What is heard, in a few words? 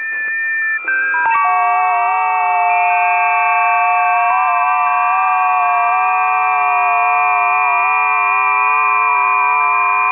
20m busy, ft8